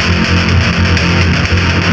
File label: metal loop